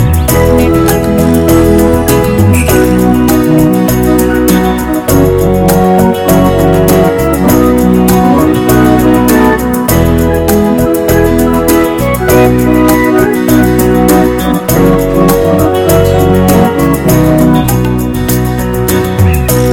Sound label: A Pretty Eight Beat Song